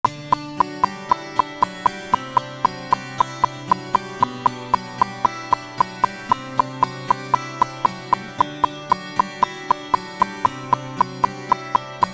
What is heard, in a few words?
guitar processed; pertusa; generated sounds Leveau